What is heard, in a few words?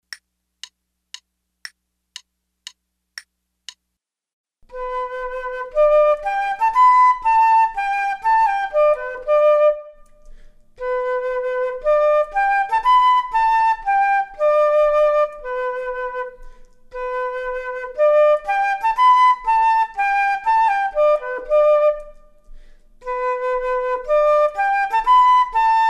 Duets flute; flute 2